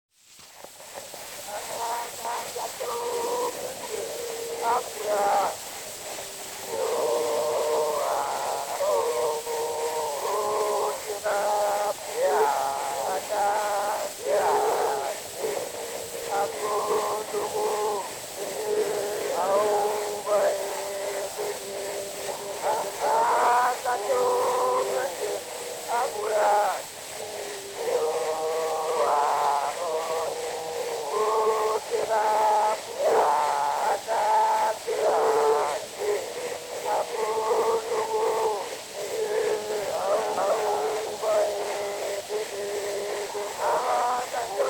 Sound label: Zulu Recordings; Father Franz Mayr; Ihubo lempi